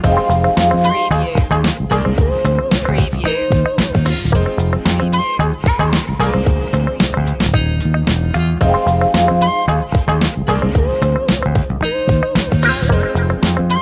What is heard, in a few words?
jazzomatic loop